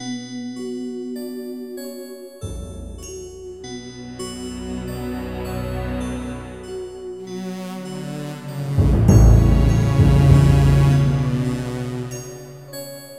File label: Reveille; music